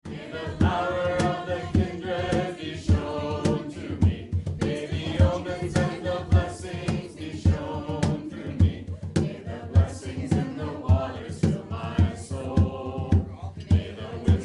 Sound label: chant blessings in the waters